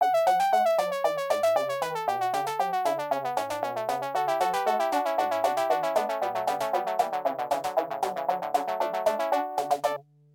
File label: cheesy synth riff